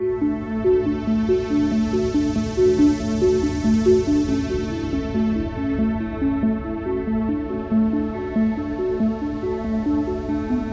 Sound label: arp